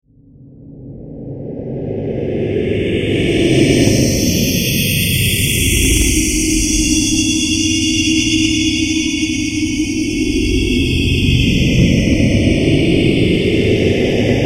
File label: Alien, Evil, Presence, Movement, Scifi; 5.1